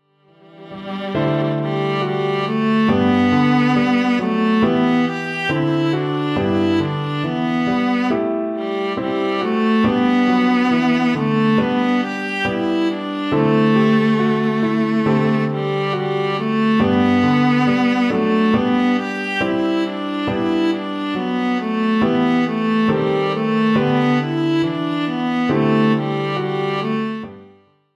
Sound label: air